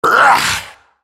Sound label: spell cast effort, en US; Rengar; lol